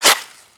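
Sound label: shovel dirt, dig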